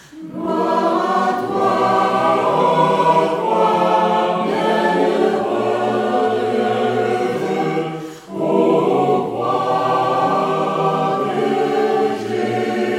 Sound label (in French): O croix bienheureuse Tutti